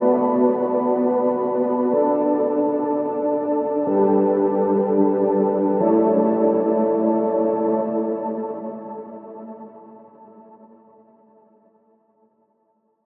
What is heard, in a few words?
Keys 124 B Tail